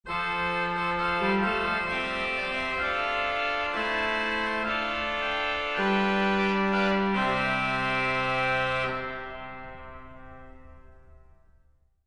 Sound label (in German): trompette, orgel